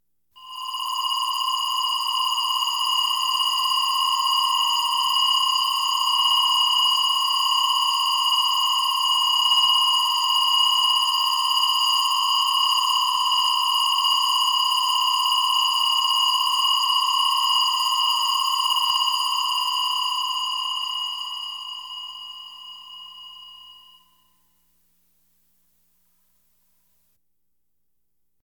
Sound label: Science Fiction effects, Lasers, Light beams